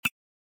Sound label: Ceramic click; Alcatel Vodafone Smart II